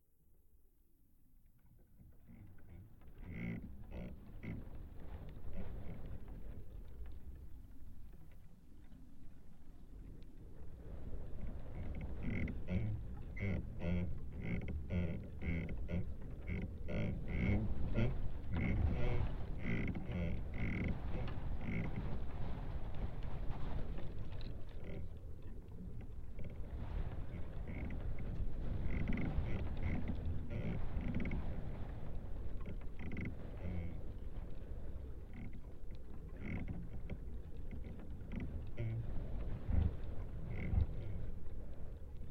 creaking tree vibrations; Elan Valley

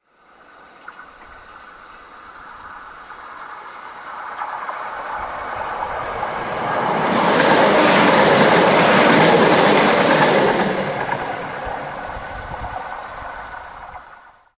train passing pedestrian level crossing; melton

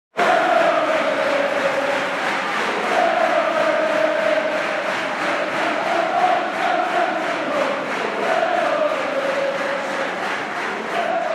the sound of English football; at fulham ffc

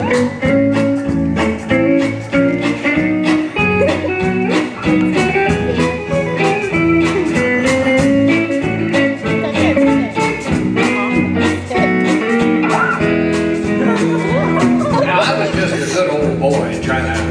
honky tonk

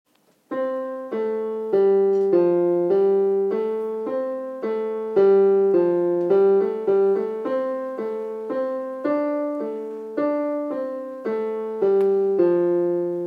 piano solo

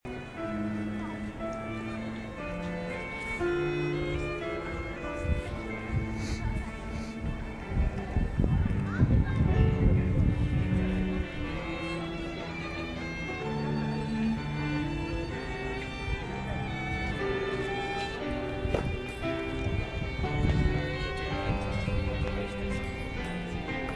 classical music on southbank London

